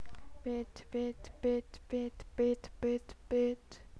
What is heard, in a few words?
beat